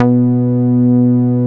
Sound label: MOOG B3MP; 90S MOOG